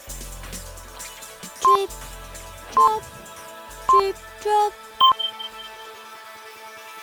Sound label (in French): Top Horaire; Europe 1